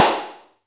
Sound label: noise fx; Masterbits; Switch FX